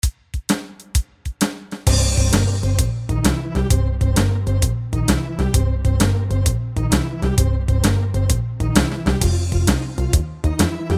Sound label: Blues Backing